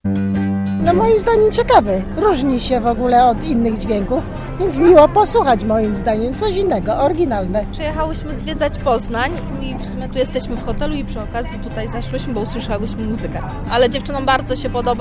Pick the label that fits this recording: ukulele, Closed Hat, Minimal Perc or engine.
ukulele